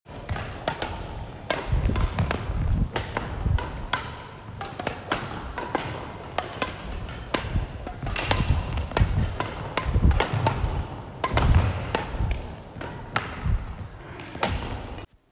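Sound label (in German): Ballgeräusch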